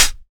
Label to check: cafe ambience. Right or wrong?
Wrong